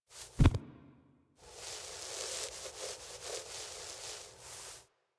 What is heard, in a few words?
Puppy Foley Stand Idle Variant; Warframe; Kubrow